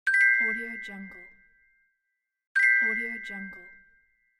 idea indication; welltone

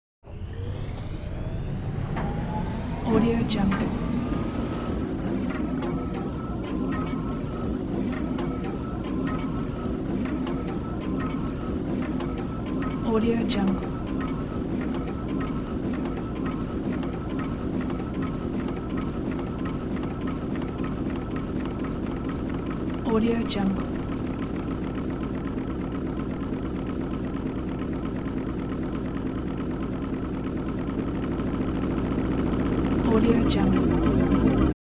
transporter wind up motor

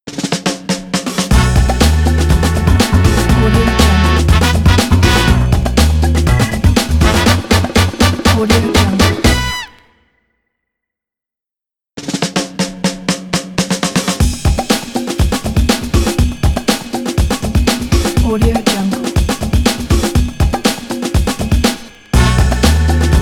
funky comedy logo

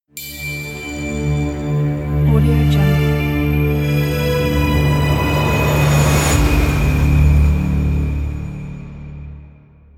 mystical cinematic logo; phantomatic

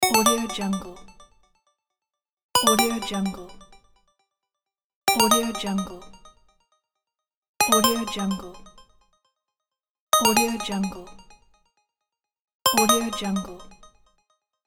game points

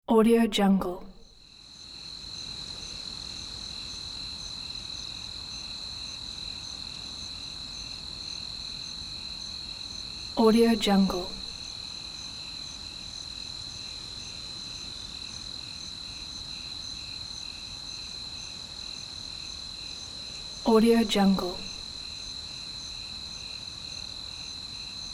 night ambience

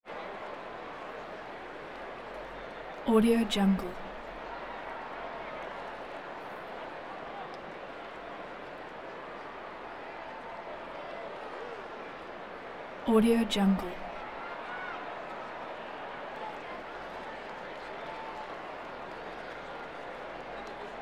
baseball crowd bat crack hit whistles

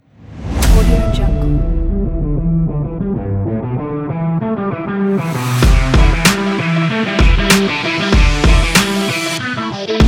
action sport rock

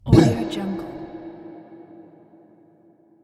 ghost boo

